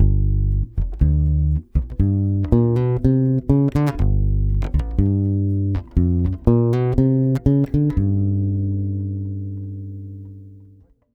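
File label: 120 BASS G minor 7, Brazil Bossa; USB Soundscan; AKAI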